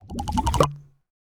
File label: Heal Drink